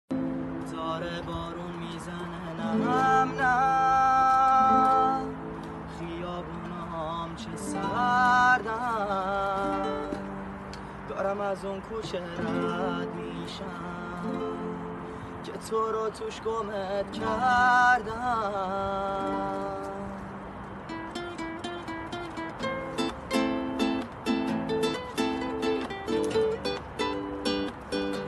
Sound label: Guitar Version